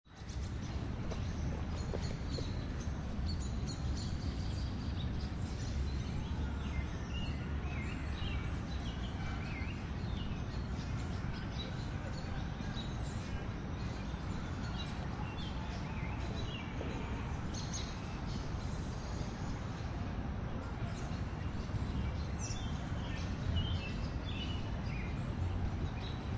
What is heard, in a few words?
Central Park Early Evening, Park crickets, cicadas. NYC, Central park, evening time; Quiet in the park, sounds of crickets, cicadas singing. City traffic; Lynne Music